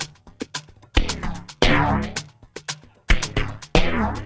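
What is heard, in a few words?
SPL SHAKER R; Spectrasonics; Liquid Grooves 1 & 2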